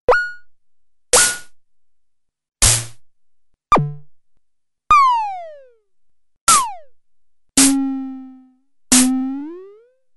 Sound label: Misc, Drumfire DF-500